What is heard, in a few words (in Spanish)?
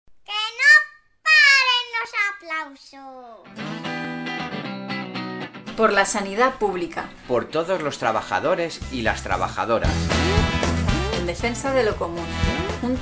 aplausos sanidad a las 8